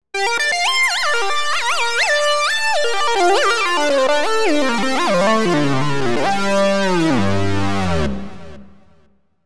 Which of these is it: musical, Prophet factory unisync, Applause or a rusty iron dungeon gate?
Prophet factory unisync